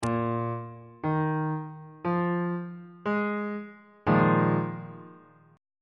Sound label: a sharp dominant 7th suspended 4th chord